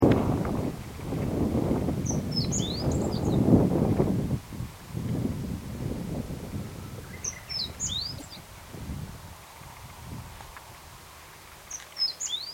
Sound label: meadow bunting